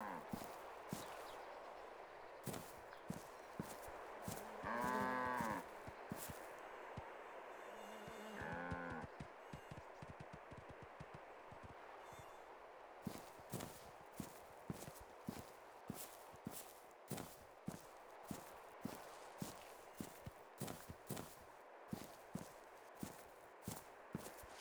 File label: environment cow; dayz standalone